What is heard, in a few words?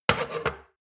shot glass place table slide hard; berufe; gastronomie; soundpool